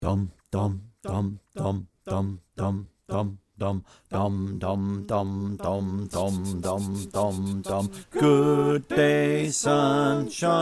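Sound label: bass, choir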